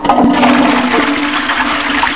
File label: TOILET